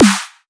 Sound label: qrest Snare